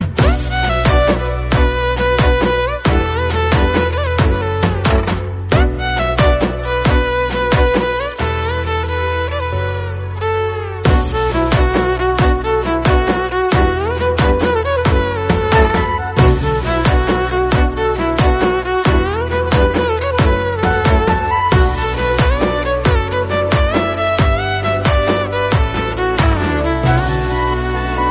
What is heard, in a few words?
instrumental